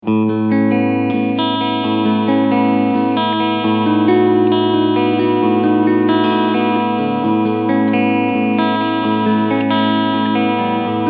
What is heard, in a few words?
ESP E-II Eclipse Neck Humbucker Clean Singlecoil